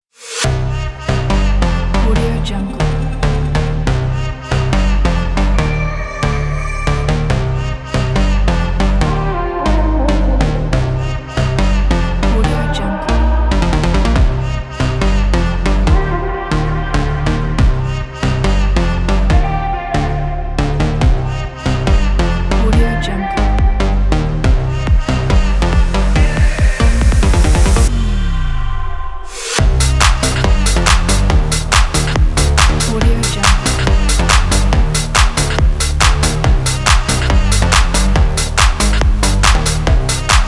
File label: youth beat